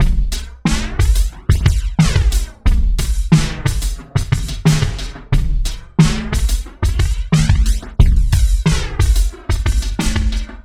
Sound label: 090bpm, Downbeat Dance Grooves